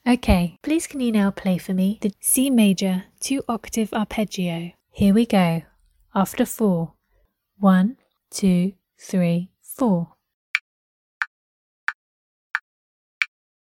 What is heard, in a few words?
c major 2 oct arp